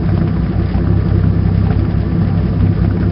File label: car